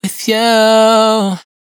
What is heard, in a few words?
hook 126 Gm, Dry Vocals; Raw Vocals; With You; Slate Digital Omnivox Vocal Sample Pack